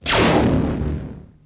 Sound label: pistol Fire Primary; weapons